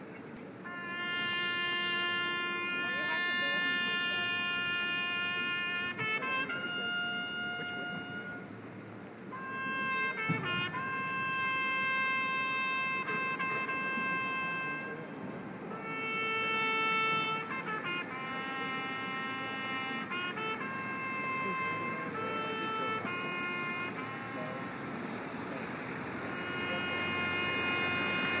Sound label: bugle